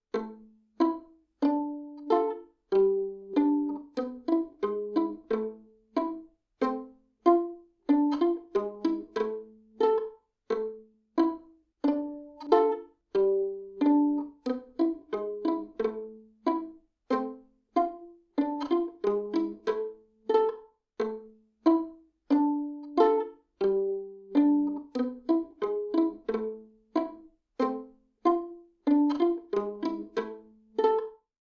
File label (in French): ballet pizz